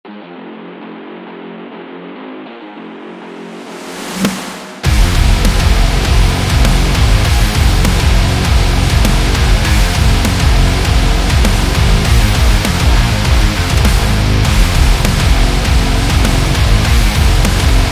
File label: Boss Fight